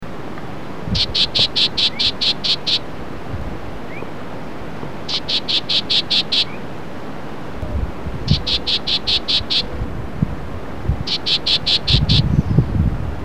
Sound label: Black Tailed Gnatcatcher